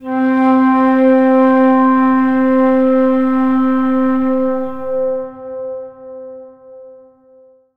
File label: flute; Winds